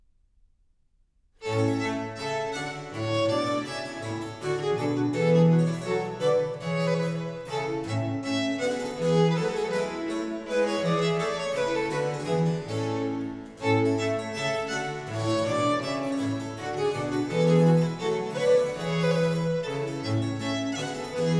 Sonata in B flat major, Gavotte. Allegro, Trio Sonatas; Handel